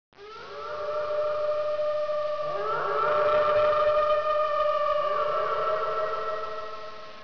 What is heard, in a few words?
wolf; ghostwolf